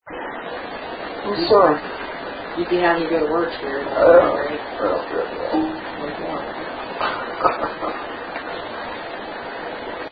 Living Room, guitar sound; Case 007 Webb Home

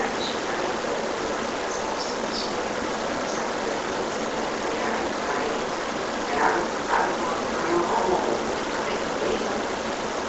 Unexplained Whisper Amplified; (Get a brain)

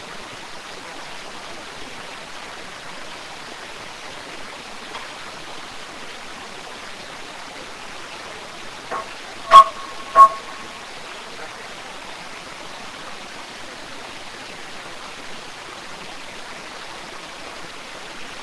unexplained noise; Case 007 Webb Home Girls Room

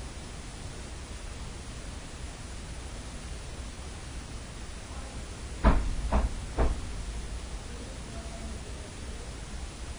Basement Unexplained knocks Amplified; Truner Home

